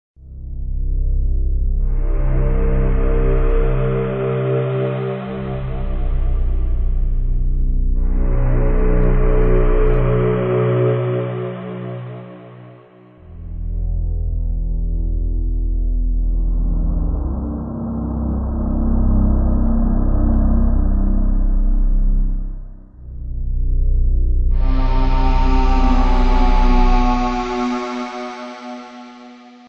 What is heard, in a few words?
riffs; the warriors